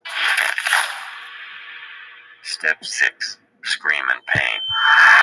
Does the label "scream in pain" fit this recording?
Yes